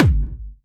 Soft, kicks

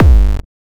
Kick n Bass

